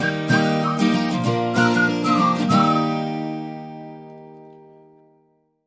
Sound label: Bumper